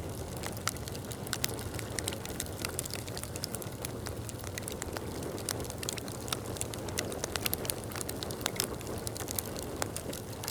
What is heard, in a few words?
furnace; factorio; Factorio 0.14.21